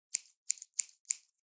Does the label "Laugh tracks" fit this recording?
No